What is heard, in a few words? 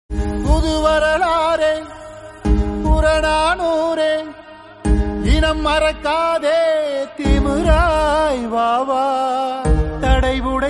tamil; pudhu pudhu arthangal